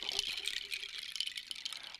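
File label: Music Village Calm Near; from dust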